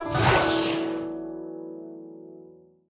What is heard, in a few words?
idle marble; smite; god merlin